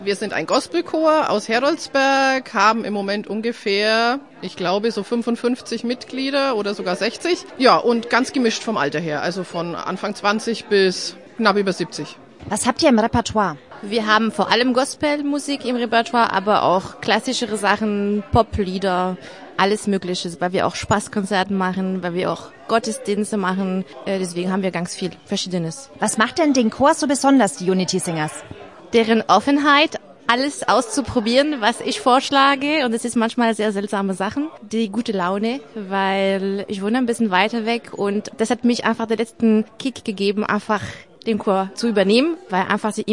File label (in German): chorfest; chorfest 2025 nuernberg